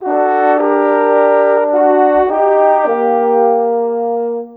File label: Horns, Cinematic; Sonic Foundry (Sony Creative Software); Orchestral Series VOL-3 - Cinematic